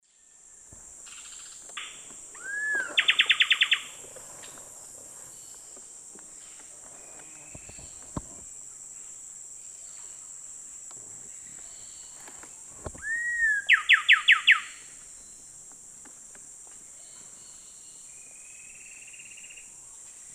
Pheugopedius coraya, duet and call; S. America; Eastern Lowlands

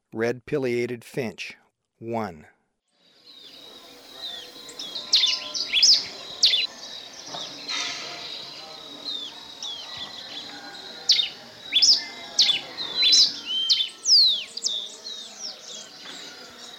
Red Pileated-Finch, Birds of Ecuador; S. America

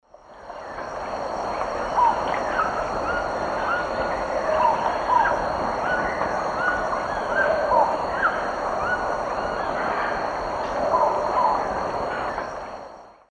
Thamnophilus praecox, call male; S. America; Eastern Lowlands

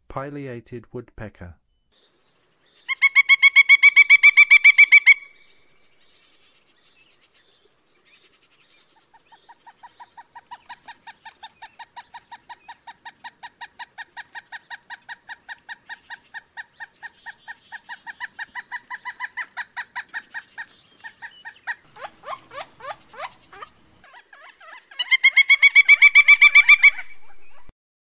Pileated Woodpecker; North America

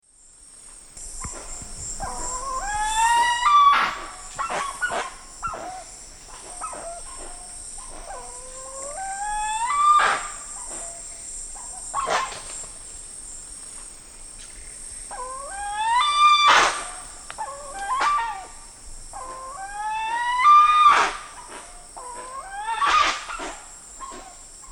Penelope jacquacu, group scold; S. America; Eastern Lowlands

